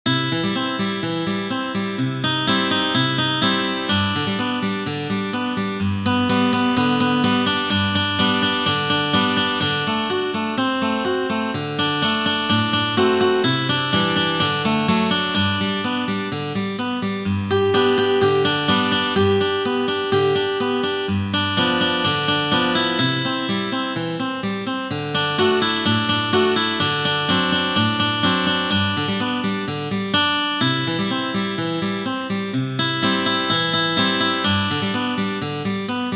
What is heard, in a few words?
synth